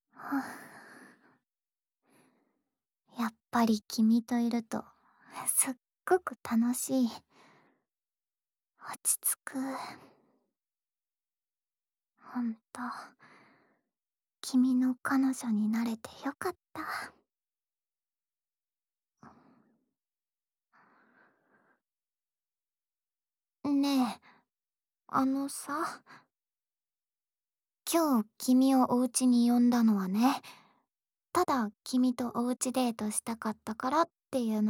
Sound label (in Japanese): 彼女の耳かき